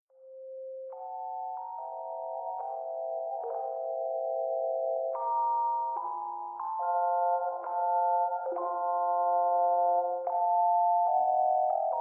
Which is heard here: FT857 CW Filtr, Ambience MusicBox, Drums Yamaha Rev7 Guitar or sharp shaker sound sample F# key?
Ambience MusicBox